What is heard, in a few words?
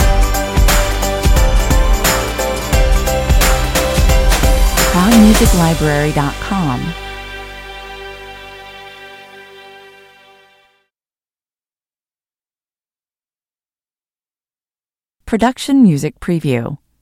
stinger